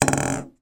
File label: foley bauble decoration christmas down bounce fast table; zapsplat